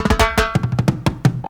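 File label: reggae fill 88, drum; BREAKS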